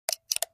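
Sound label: Mechanical Button Double, switches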